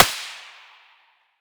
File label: svu muzzle mono far, weapons